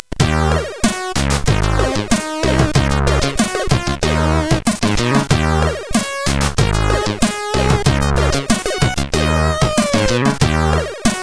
Just Jazz, CSG8580; hvsc

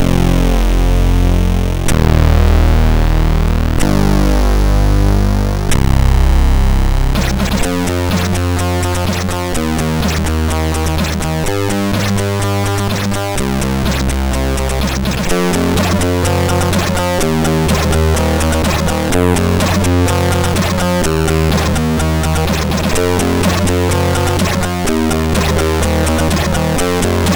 Hardbeat sid MOS6581R4

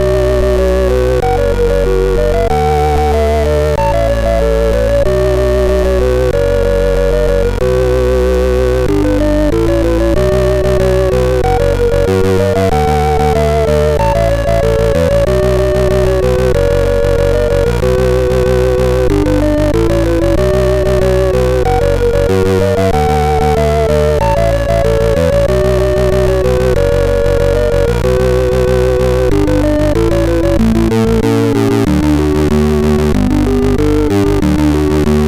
tune, sid MOS6581R4; Breakthrough 2 tune 6; hvsc

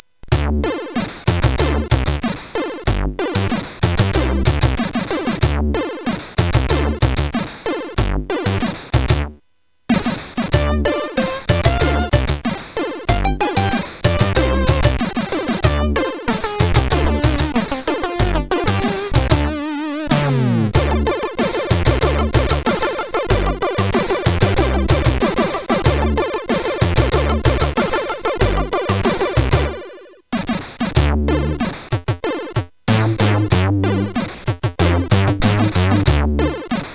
JAZZ VIB, CSG8580; hvsc

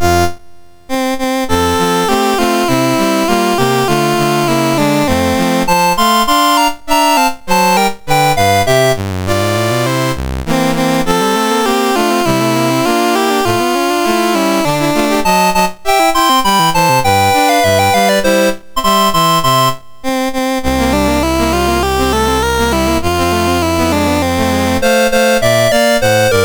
Paraguay, National Anthems; MOS6581R2; Dave Marquis

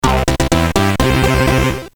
Jingles, CSG8580; CSG8580R5; hvsc

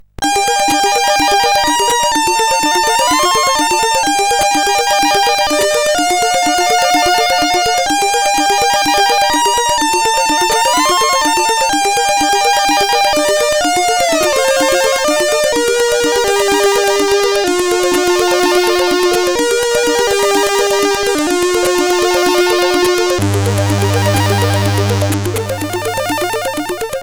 Slow Arpeggios, CSG8580R5

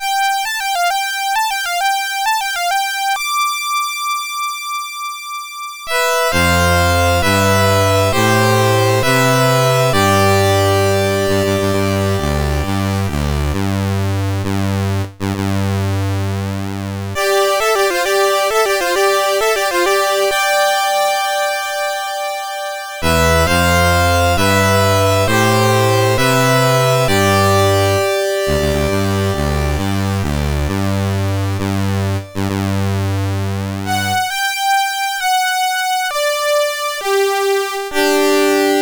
menus, sid CSG8580R5; Games Summer Edition

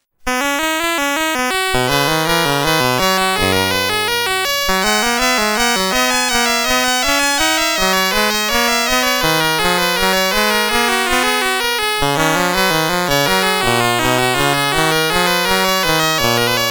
BASIC, CSG8580R5; Commodore; Invention 1 in C BASIC

